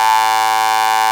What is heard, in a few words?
RAVE BEEP; Best Service Alpha Dance vol.2